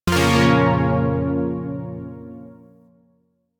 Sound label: Shawzin Hirajoshi Chord; Zariman Shawzin